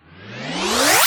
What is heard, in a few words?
FX Scratching; Vengeance Samples